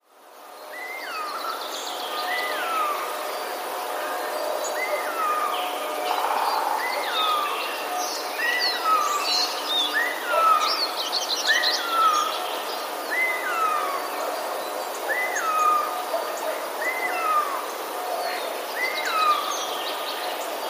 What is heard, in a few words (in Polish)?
sojka; sójka podobnie do kwilenia jastrzębia